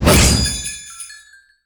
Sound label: slice off